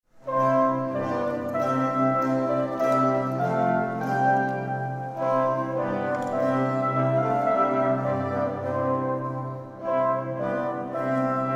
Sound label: Fanfare; C. Bendinelli